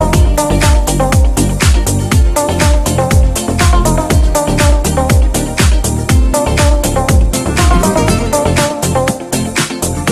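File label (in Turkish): yeni türk sıcak zil sesleri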